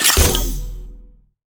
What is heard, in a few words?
eblaster screw in grenade, energy pistol; weapons; Half Life Alyx